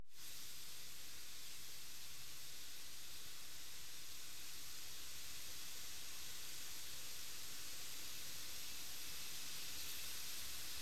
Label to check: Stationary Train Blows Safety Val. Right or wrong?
Right